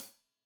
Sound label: Hat Loose, Hi-Hat, Cymbals Stereo